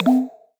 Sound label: Retro FX; Cymatics; Video Game Sample Pack Vol 1